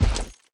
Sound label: Zombie Warrior move